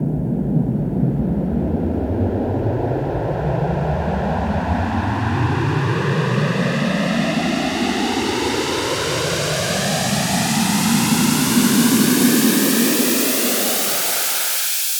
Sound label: FX Uplifter